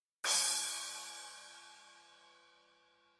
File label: crush cymbal